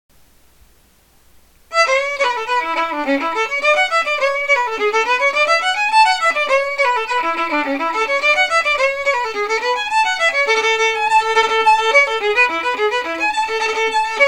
Violinist, violin solo, a popular and very fun Irish/Celtic fiddle